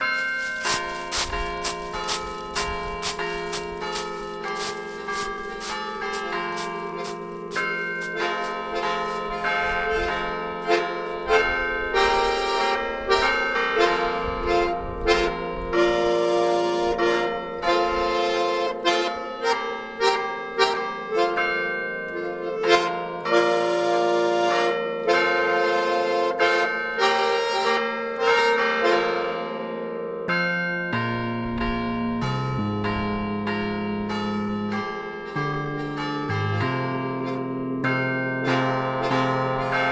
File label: Campanile Loop